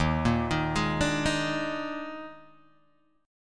D sharp Major 7th Suspended 4th, D sharp, down, Guitar, Open G